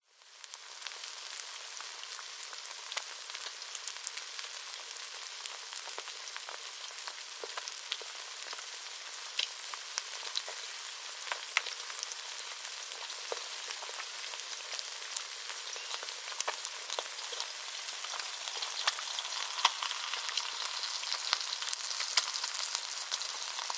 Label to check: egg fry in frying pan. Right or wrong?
Right